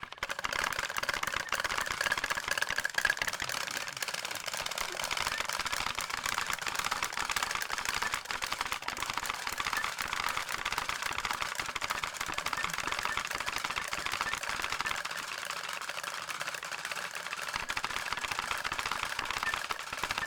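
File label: Wood Movement Raedeln Wood Spinning; Heiden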